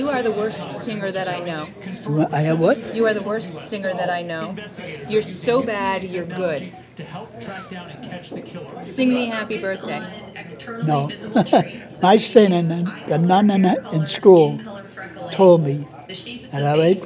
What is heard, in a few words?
sings happy birthday